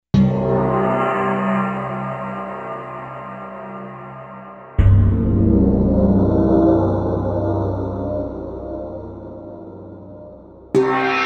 Gong

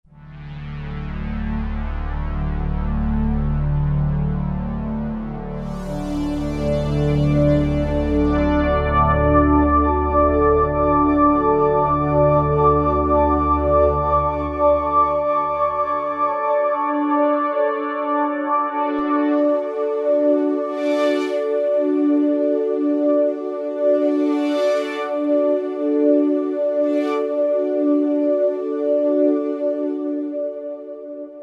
Roland V-Synth Touchpad Pad; Factory Patches

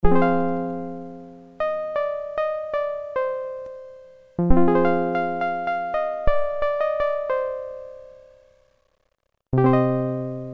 Lute, Roland Juno-106; Factory Preset

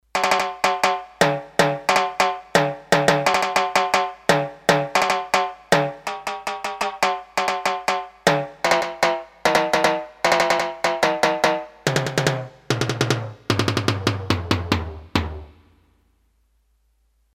Timbales, Roland D-10; Factory Patches

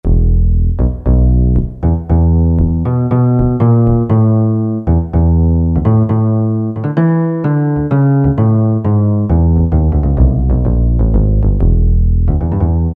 Wood, Yamaha TG33; Factory Presets

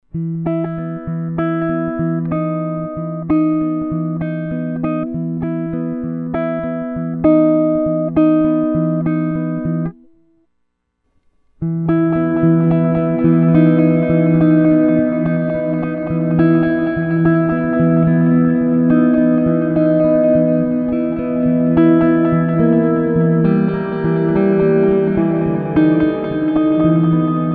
Earthquaker Devices Avalanche Run

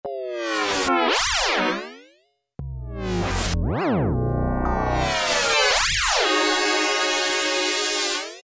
GOTCHA, Yamaha DX7

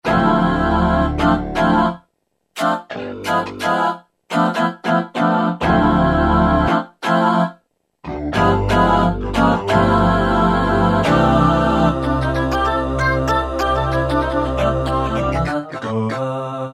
CH GaChoir, Yamaha TG77; Factory Presets

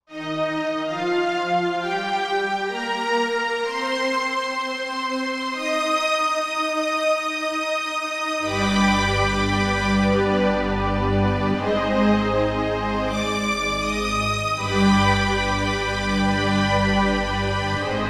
Octave Strings, Korg Wavestation A-D

